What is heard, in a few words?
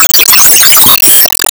Laystormlaser Shot